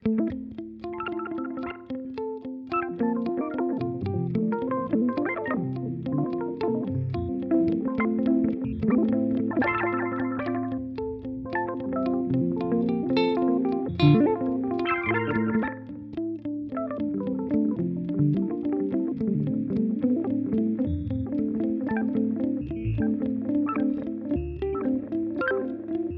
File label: Thermae Step Intervalls EXPR; Chase Bliss Audio